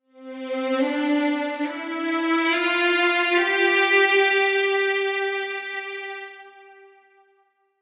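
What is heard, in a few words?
Strings Expression On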